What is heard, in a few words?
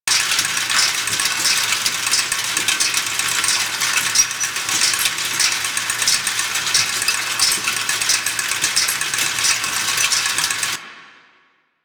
Settlement Conveyor Belt Loop